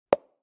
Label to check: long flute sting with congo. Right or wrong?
Wrong